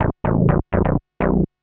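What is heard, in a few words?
synth bass, house, 125, A; eJay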